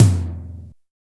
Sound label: HEAVYTOM MD; Drums In Your FACE!